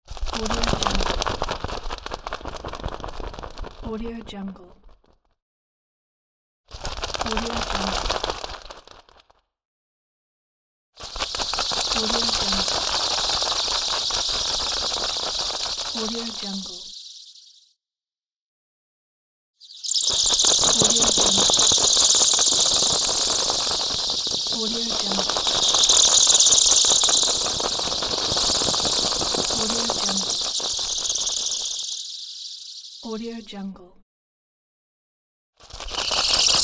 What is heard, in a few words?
swarm of bats; floraphonic